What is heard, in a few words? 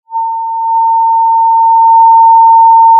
pop, Sound of a cruise ship horn